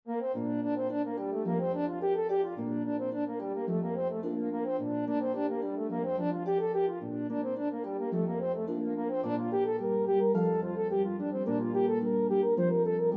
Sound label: The Morning Star, Duet for Horn in F & Harp, reel